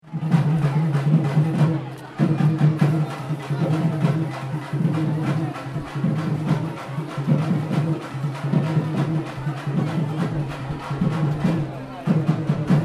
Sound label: Drums at Batu Caves